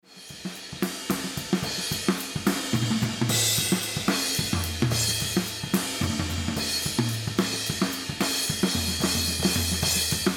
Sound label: Mix Drums Tom Pan